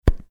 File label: making mouth popping sound